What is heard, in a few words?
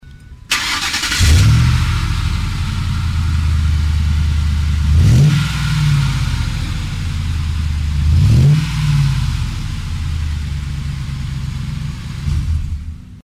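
exhaust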